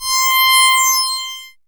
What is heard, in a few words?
synth, c, Sweep Synths; Dance Synths